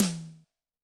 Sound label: Yamaha DTXpress-II Tom